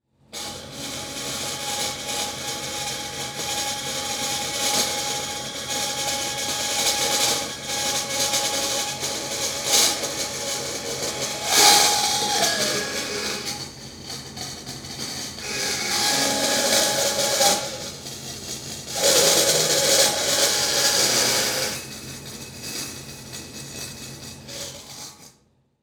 Industry Fan Saw Cut Metal

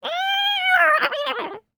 Animal Mammal Carnivore Cat Wildcat Groan; animals; AdobeCC

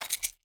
Toothpicks Container Shaking, Household

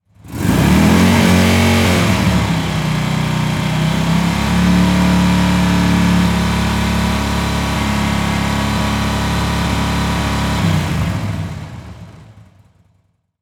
Motorcycle Rev Long; Auto; Transportation

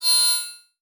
Beep High Pitch; Multimedia Internet CD-Rom Flash

